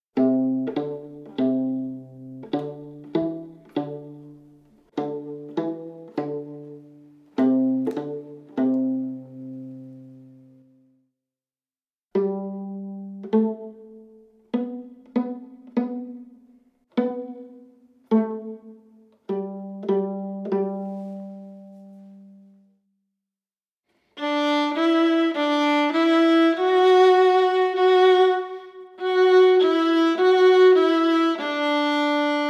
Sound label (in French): Improvisation Q et R Alto